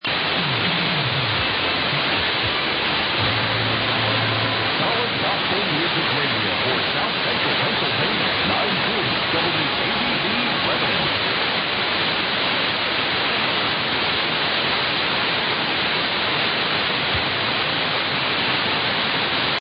Lebanon PA; 940 WADV Lebanon PA

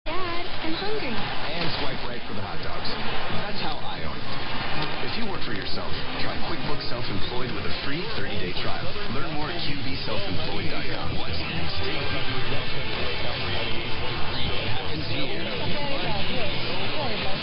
southern gospel; WJNO West Palm Beach FL 0400 UTC 7-1-15

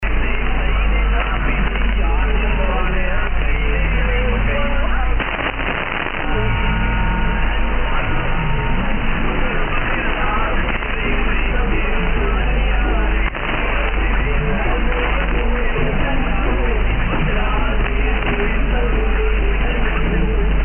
Radio Australia and Islam Radio; 1701.7 Radio Brisvaani

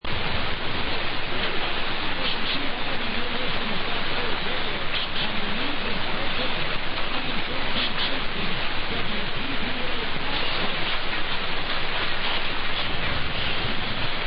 Pottsville PA; 1360 WPPA

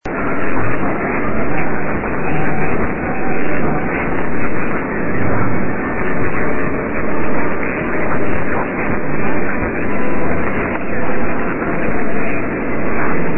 675 Radio; Maria (p) Netherlands

